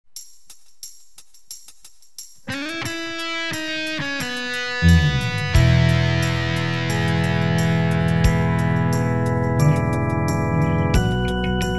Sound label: Joy to the World